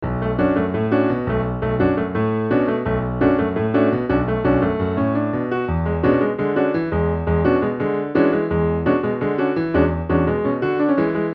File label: piano; easyrpg; WitchsHeart